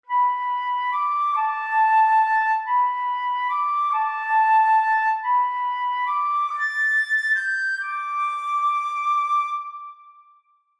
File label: Ocarina; Ocarina of Time